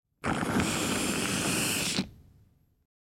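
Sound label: Alien Breathing Large, wheezing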